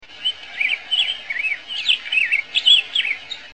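Black-Headed Grosbeak (Pheucticus melanocephalus), Song; FAMILY EMBERIZIDAE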